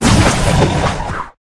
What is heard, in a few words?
Tiger